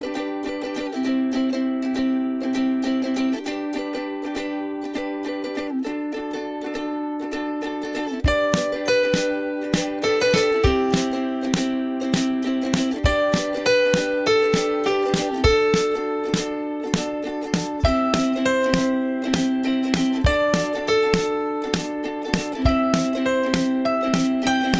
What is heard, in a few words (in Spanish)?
Guitarra Kids